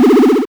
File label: checkpoint actived; Season Land